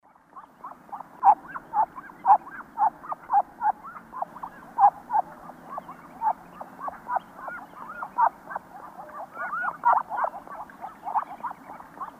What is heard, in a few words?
Cairina moschata, calls flock; S. America; Eastern Lowlands